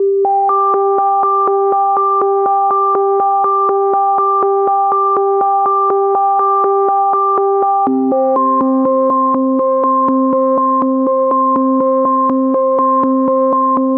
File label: 122 BPM Melodic Arps A maj G min Tonic F; MMB